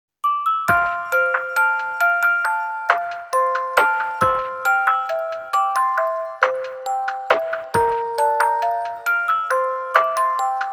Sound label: Instrumental version; Soukyuu no Fafner